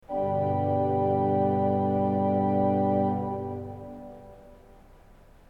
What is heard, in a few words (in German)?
ton, orgel